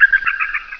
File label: birds